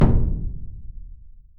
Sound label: flag taken